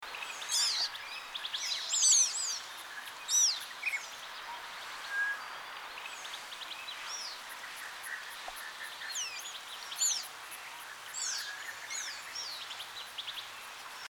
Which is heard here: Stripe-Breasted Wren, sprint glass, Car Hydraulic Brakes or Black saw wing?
Black saw wing